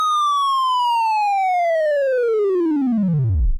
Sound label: fall; C64; LodeRunner TotalRecall